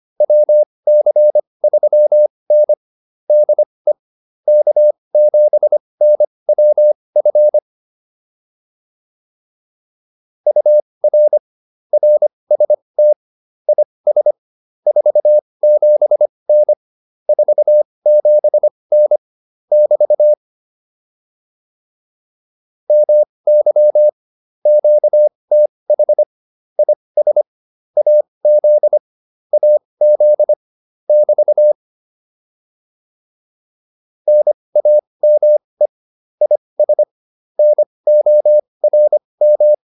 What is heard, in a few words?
QSO, advanced practice files